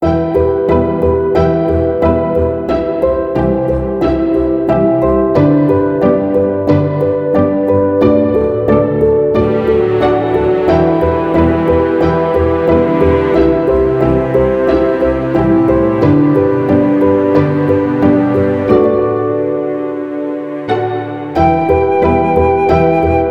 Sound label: Pop Renaissance - Lg. Loop; Midas Gold Plus